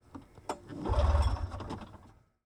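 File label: polaris indy trail deluxe exterior start try fail; 1991; Pole Position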